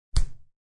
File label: A punch.; Punches and Hits; Combat